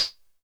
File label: HH, Vermona Mono15 Drums